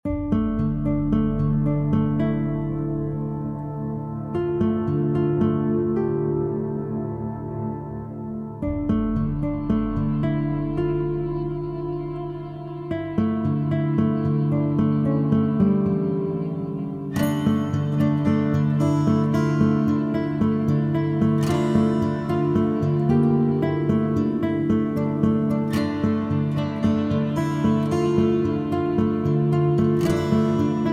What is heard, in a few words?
acoustic band